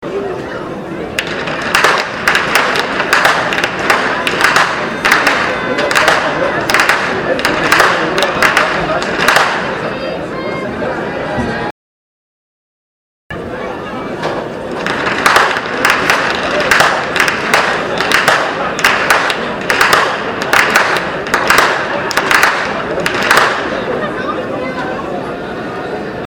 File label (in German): schnalzen, jugend; liefering